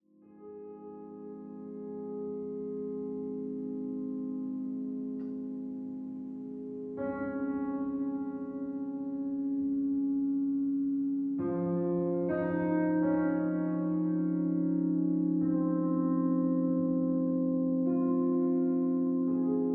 piano

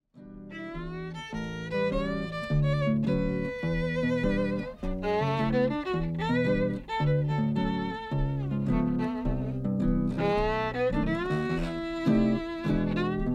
duet

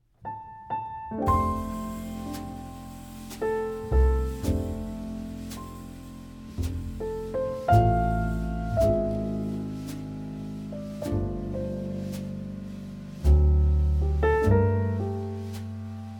jazz lullaby

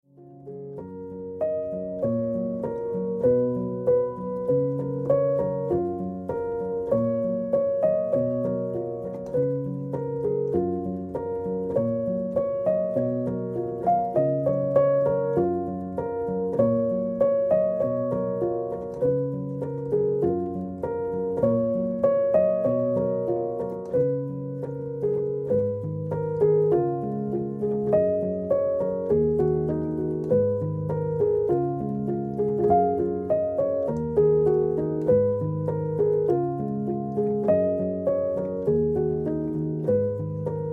piano

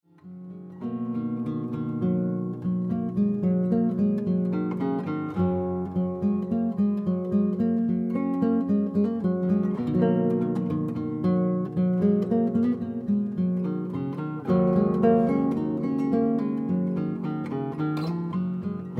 guitarscape

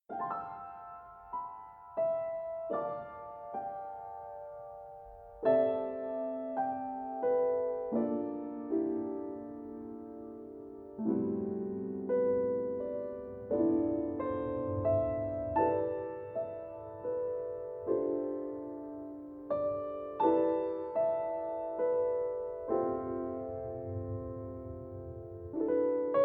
piano sketches

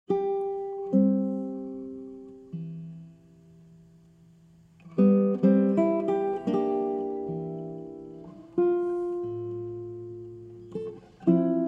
violao violao

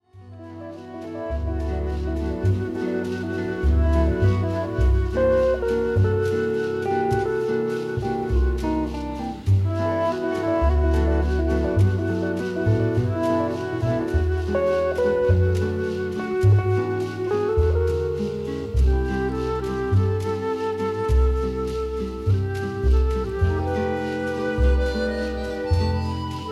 guitar

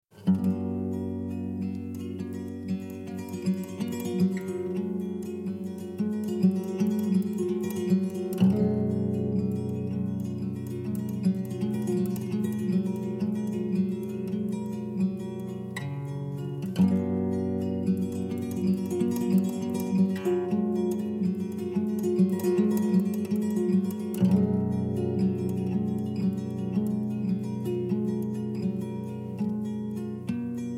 guitar

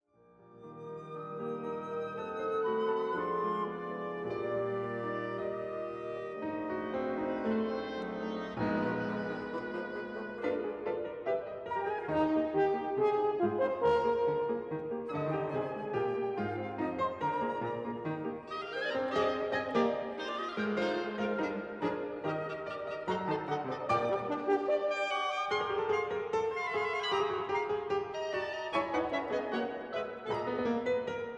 piano wind; poulenc

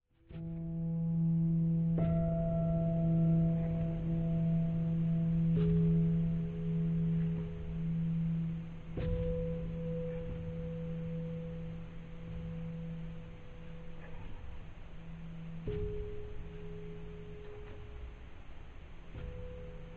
five pianos

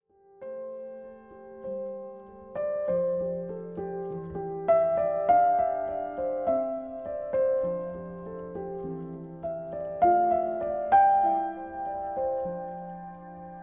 piano miniatures

